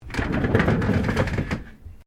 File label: Chair office wheeled move; Office furniture; Chairs; Offices